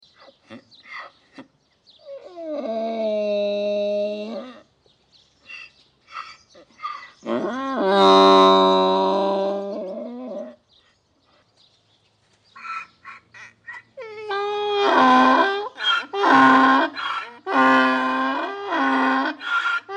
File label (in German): esel